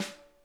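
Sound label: center, snare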